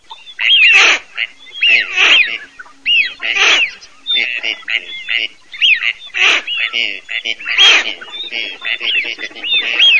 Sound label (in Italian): FISCHIO MORIGLIO CANAPIGLIA